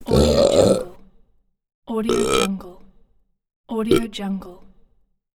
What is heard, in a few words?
burp